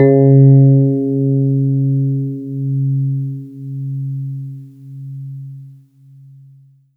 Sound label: Yamaha DX7 E Piano C3